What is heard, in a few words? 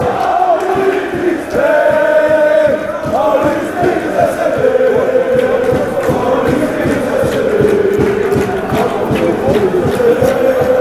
anderlecht chant